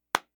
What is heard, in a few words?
Single Clap